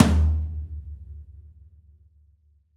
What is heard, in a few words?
Pop Tom, Roland BK-5